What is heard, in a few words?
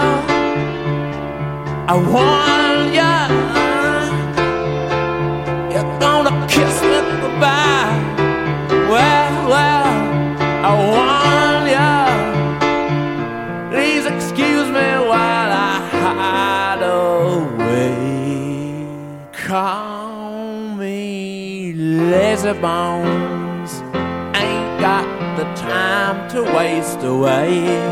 Piano